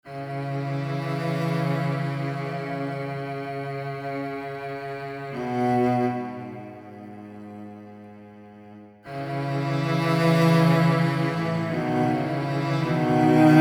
Cello Duet

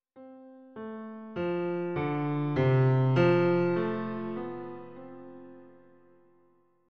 Variable Dynamics With Hairpin ff

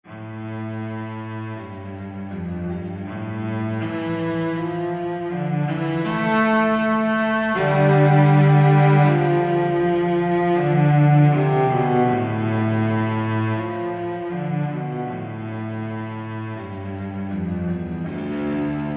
Solo Cello

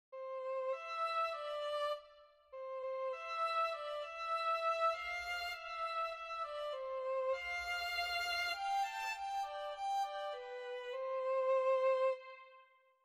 Violin Articulations Legato

